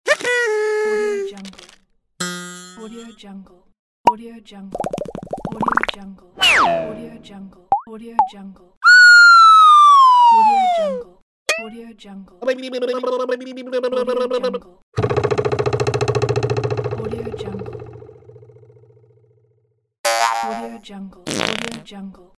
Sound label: funny cartoon pack